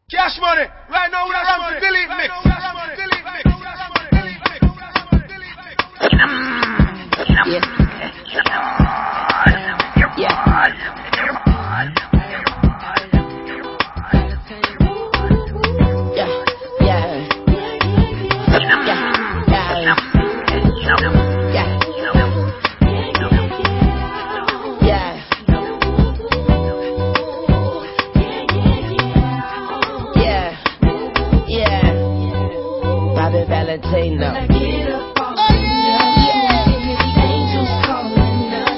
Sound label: hiphop